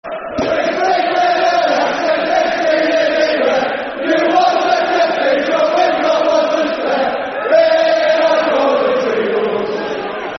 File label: In Our Coventry Homes [Football Chant (Soccer Song)]; Coventry City Ccfc